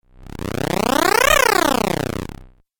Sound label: Cool Fx Video Game